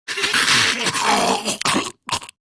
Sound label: Death Grineer Ghoul Sargeant; Grineer Ghoul Drill Sargeant; Battle Barks